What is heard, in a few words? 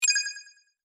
Countdown